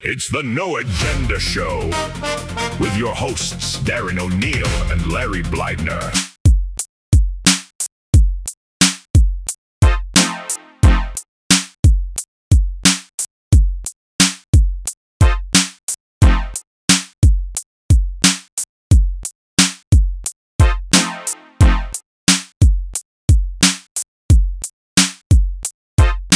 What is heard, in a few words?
intro donut brass mid